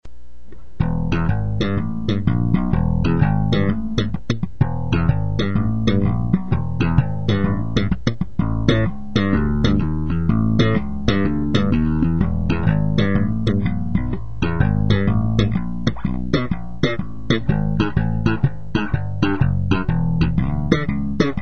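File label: fender slap, bass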